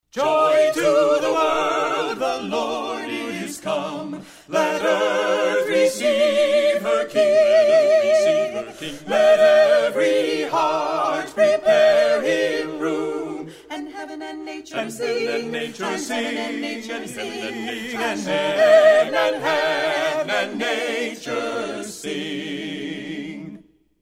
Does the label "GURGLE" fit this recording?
No